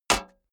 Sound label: shovel impact